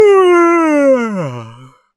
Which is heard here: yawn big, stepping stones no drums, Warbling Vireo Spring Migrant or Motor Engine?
yawn big